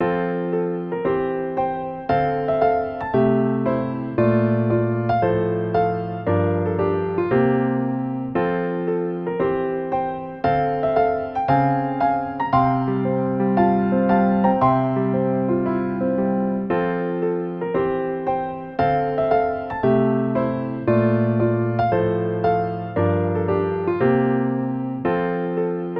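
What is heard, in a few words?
celebration; 嬉しいことがあってお祝いするような楽しい雰囲気のピアノ曲です。; ループ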